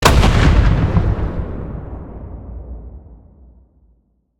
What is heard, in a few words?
Bomb Explode; Scraprun; Deathrun